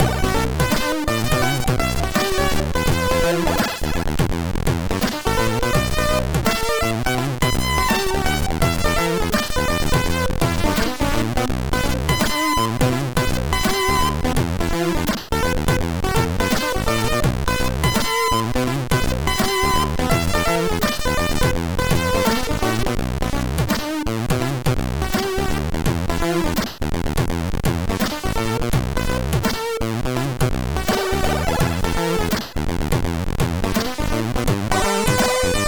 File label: A Slower Europop Thing, sid CSG8580R5; hvsc